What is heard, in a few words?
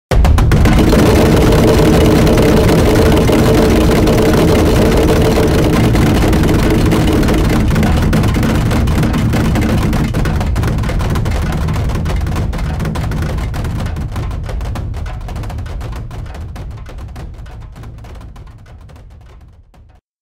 lottery sound